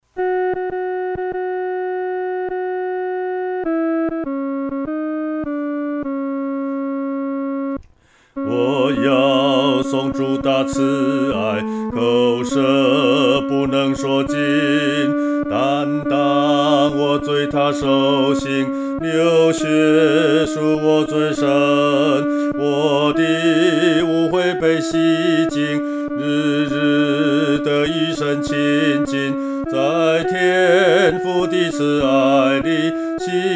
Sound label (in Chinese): 独唱 第二声; 颂主大爱